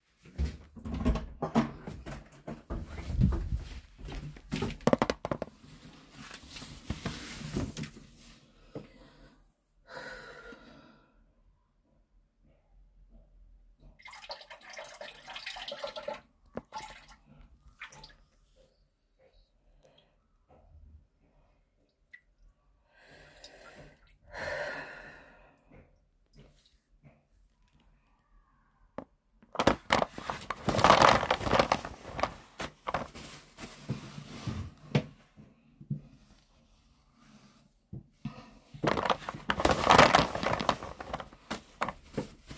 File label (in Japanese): 急いでトイレに駆け込んで! オシッコですッ!